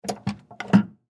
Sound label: Arcade Game Race Car Brake Pedal Push; Technology; Race Cars; Blastwave